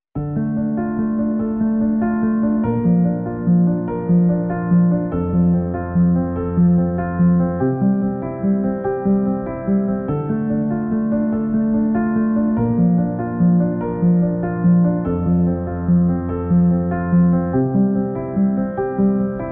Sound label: melodic interlude two